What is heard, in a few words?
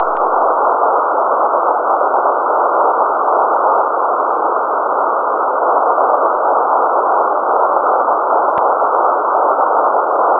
aurora filter example